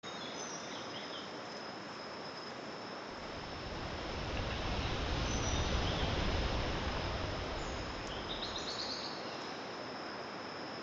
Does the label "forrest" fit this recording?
Yes